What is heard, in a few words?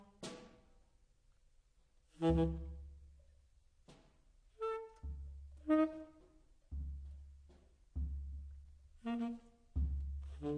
Improvisation, Quartet; Bipolar